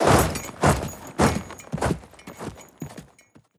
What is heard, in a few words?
give army move order; europa universalis iv